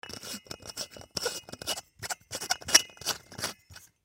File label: Desk Lamp Light Bulb Screw In; Office; Lamps; Household; Blastwave